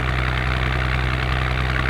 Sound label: jeep med